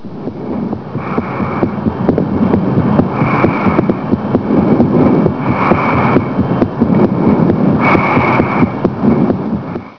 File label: loud breath